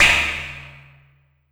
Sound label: PERC, Drum & Bass, 170 BPM